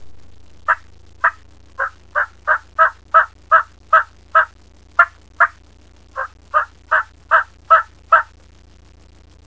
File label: Hen Locater Regroup Call